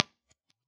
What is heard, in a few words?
4x12 G12M-65 SM57 2.0in; IRs